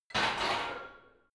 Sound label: barbell heavy plates replace rack reverby, fitnessstudio